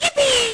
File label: lofi Sega sample; Lofi Sega Samples